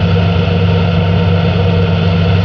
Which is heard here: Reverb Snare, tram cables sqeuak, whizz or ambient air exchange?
ambient air exchange